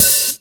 original open hat one shot c sharp key

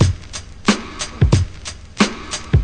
119 bpm breakbeat sample f sharp key

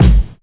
dry bass drum g sharp key

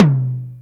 low mid tom sound c key

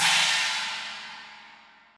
large room cymbal sound sample a key

